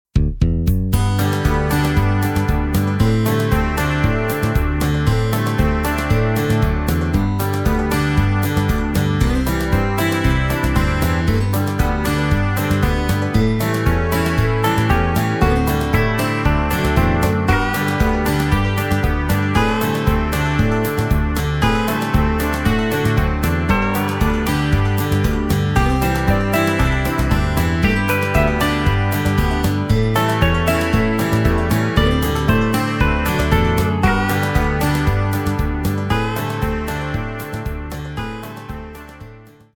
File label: country guit